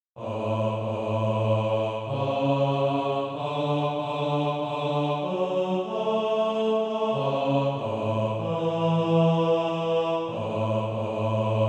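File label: bass, Practice Music; A Few More Marchings Weary; SATB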